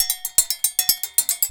bulu grooves; Best Service; World Colours

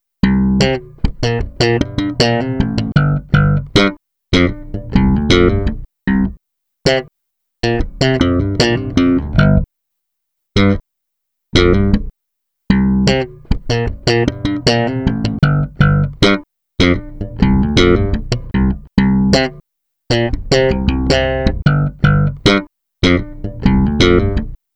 Bass, Electro Lounge; Sonic Foundry (Sony Creative Software); 077 Jive Woman

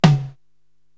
TOM Old R&B, Korg TR-Rack

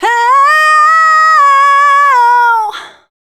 female dance vocals

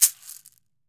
CAXY, CAXIXI; AKAI S-Series CD-ROM Sound Library VOL-5

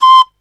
BAMB SH, BAMBOO FLT; Soundscan vol.60; Asian Traditions; AKAI

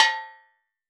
Steel Drums Layer A5, Calypso Steel Drum; Percussion

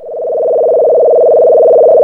C#, Synclav FM, FX; Big Fish Audio

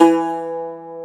Cumbus Saz E2; Planet Earth; Digital Sound Factory Planet Earth

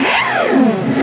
SCRAMBLER; Distored Reality

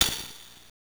Tambourine; Phantasy Star IV; M8; Genesis

